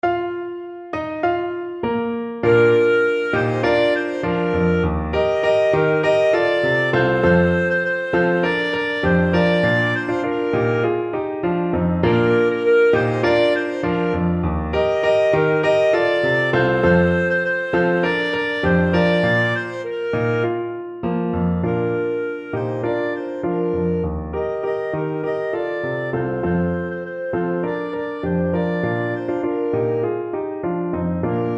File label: Shosholoza clarinet